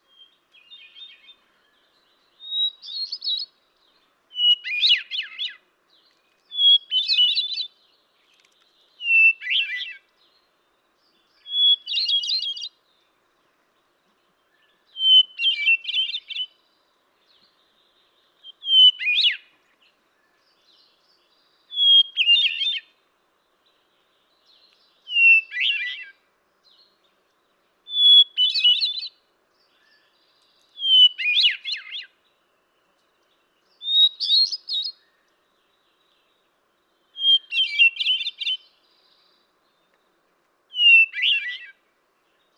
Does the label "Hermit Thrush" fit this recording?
Yes